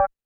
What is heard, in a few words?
percussion, Roland TD-20